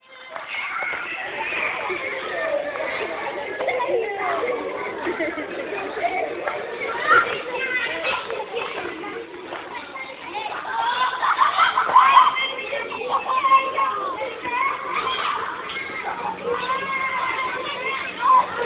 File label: Children Rennes